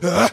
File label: growl, Voice